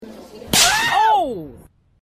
slap oh; made with Voicemod

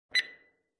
Short Beep Sound Effect

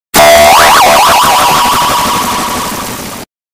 gravity coil; roblox

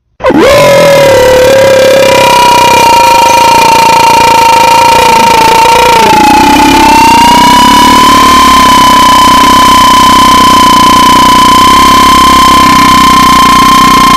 Raawwwwrrrrrr; meme sound

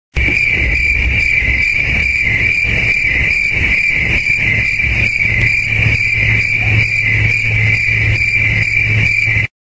Crickets

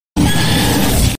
jumpscare; Huggy

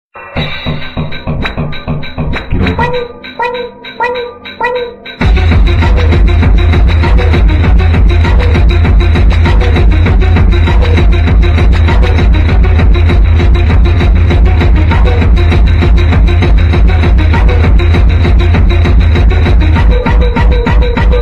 boing song meme

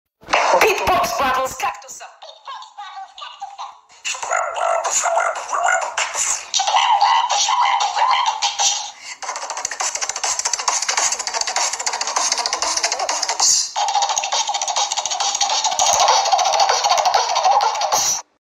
beatbox; cactus

